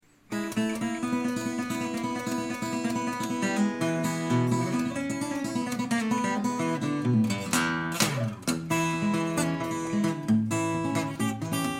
maton picking mic; maton ebg 808

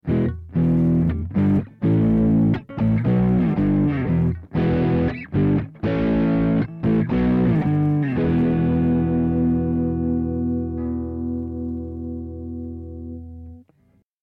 vox ac 30 low gain low tone; vox amplug ac30

